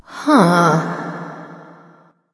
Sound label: confused light; smite; voicepack; hel